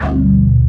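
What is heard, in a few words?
CUTTER X BASS; Pro-Rec - Synthsonic; TRINITY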